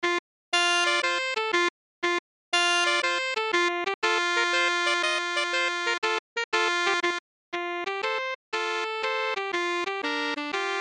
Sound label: Sax Duet